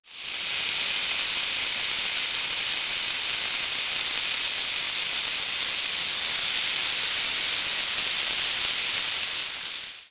sizzle

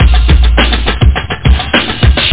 shshsh; OctaMED SoundStudio V1

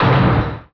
hit; BESTSHOT; noisybit

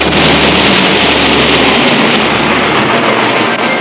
lightening, nature; Sound Effects for Multimedia - Volume 1

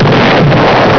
EXPLO; Master Clips Email Animator

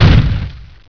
Step; tbeast

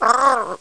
drown choke; Baaaa!; Ferazel's Wand

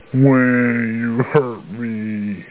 hurt; Gold Medal Software - Volume 3 (Gold Medal) (1994)

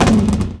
antilag ext; Rally Xtreme; cars

